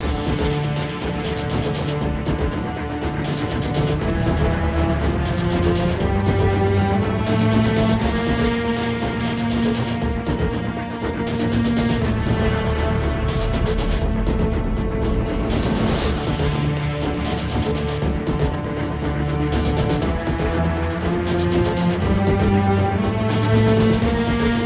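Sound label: Music Drive; Metroplex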